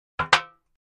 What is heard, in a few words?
Aluminum Pan Buckle; barrels buckets & cans